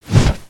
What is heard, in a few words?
jet pack sweetener; emotes